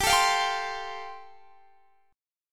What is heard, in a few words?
G 7th Suspended 2nd Suspended 4th, Guitar Standard; x,22,19,22,20,20; strummed; capo=3